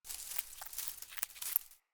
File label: Hare Idle Chatter; Encounters; Animal Rescue